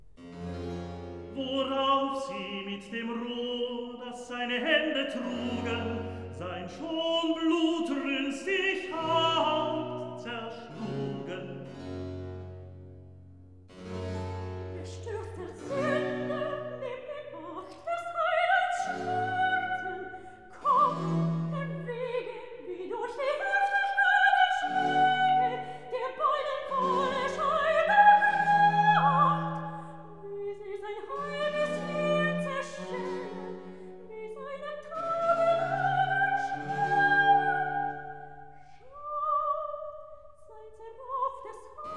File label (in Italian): Recitativo, Recitativo; Worauf sie mit dem Rohr; Bestürzter Sünder; Brockes Passion